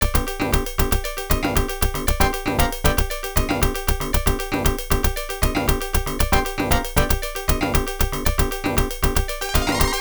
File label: Bonus Stage BGM; Shadow Dancer; arcade